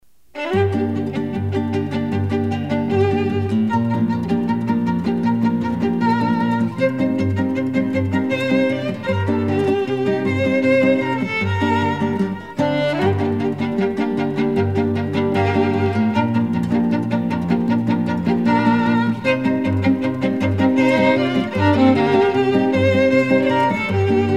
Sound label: Traditional, Guitar Flute Violin